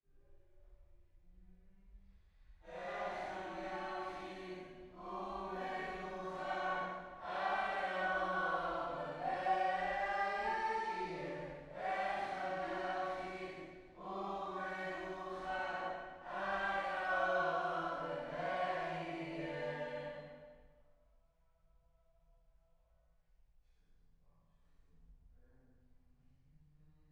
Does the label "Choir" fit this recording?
Yes